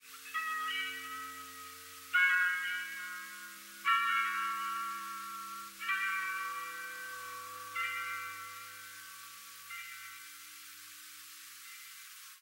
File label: Plucked Strings; Odeon